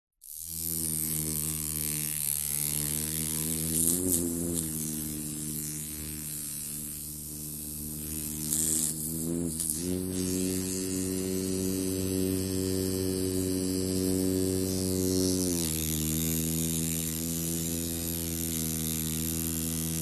science fiction micro flying drone helicopter propellers flying around fast; zapsplat